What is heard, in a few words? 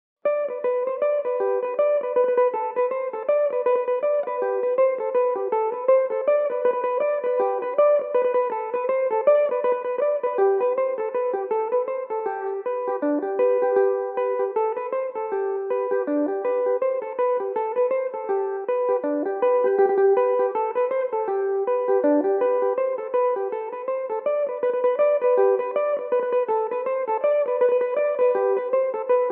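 G major, Irish mandolin; The Blacksmith; Belmuse EM-190 electric mandolin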